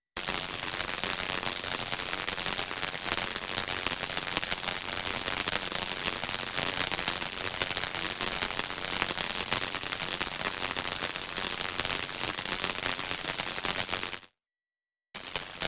welding electric; sonniss gdc

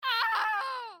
female scream in pain agony wounded screaming; Sonniss GDC

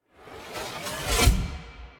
Ready; lancer weapon fx

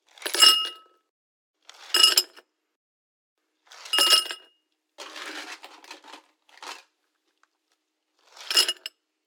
ice filling glass; sonniss gdc

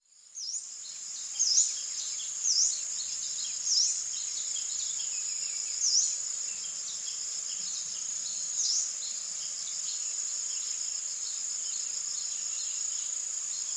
echoes tam coc forest day; asia; fvtt sonniss gdc audio

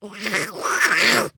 monster struggle grunt; sonniss gdc

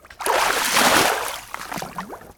water splash

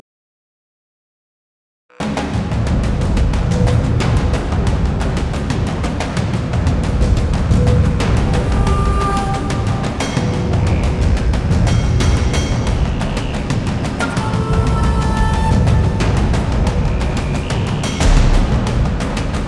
jungle combat

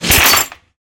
layered material hit; fvtt sonniss gdc audio

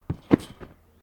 trampoline realistic

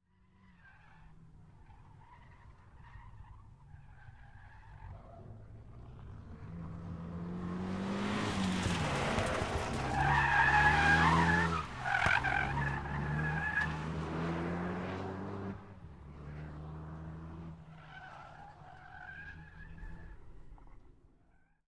pass by drift; Sonniss GDC

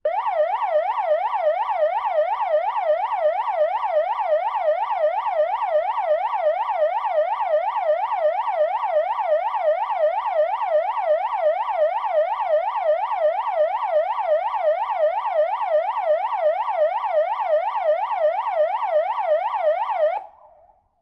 firefighter siren type 2 distant; fvtt sonniss gdc audio

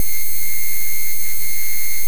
malfunction glitch short; Sonniss GDC

